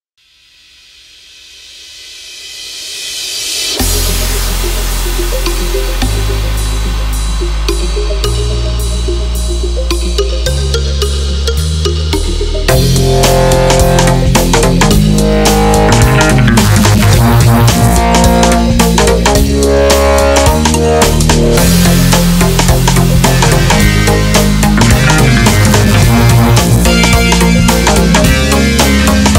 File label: Glitch Hop 110BPM